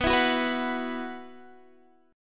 B Suspended 4th, Guitar, Standard; strummed; capo 9

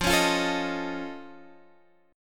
F Augmented 7th F Guitar Half Step Down, chord

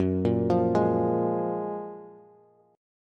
Gb Major 9th, down, Bass; GbM9; arpeggiated; JGuitar

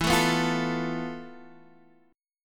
F Major 7th Flat 5th E, guitar chord

